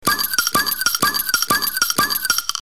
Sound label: plop; Flensburger Brauerei